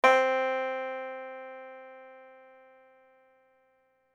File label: Banjo